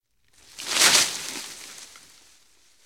bushes; Project Zomboid v41.73

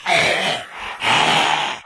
zombie agg

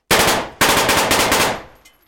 assault rifle distant; Project Zomboid v41.73

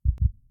heart; Project Zomboid v41.73

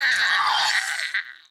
Female zombie Death; Project Zomboid v41.73

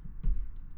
footstep upstairs; Project Zomboid v41.73

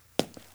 zombie footstep; Project Zomboid v41.73

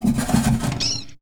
Animal Trapped wood; Project Zomboid v41.73

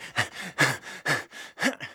male out of breath; Project Zomboid v41.73

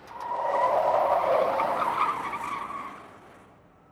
vehicle skid; Project Zomboid v41.73

